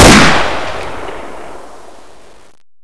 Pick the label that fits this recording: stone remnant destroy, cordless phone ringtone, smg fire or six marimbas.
smg fire